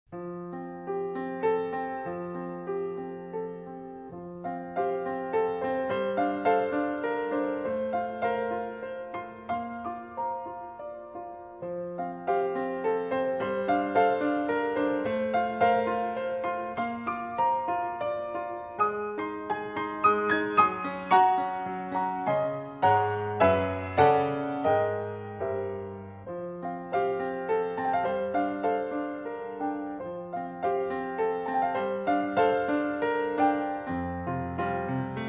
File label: piano; 2nd movement